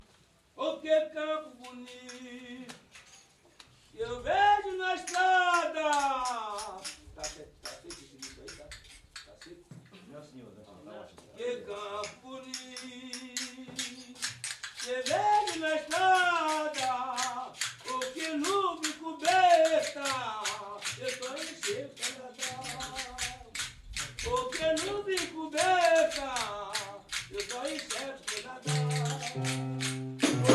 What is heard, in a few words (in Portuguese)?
fanti baiao de princesas; campo bonito